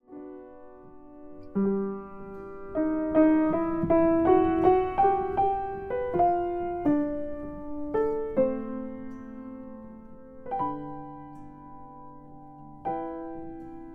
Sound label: Apf Dry C-100; microphone; hi-res